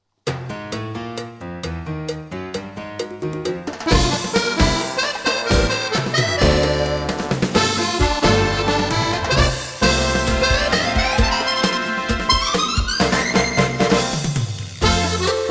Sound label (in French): CHACHA LATINO